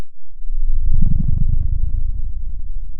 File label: pop, Sound of a dinosaur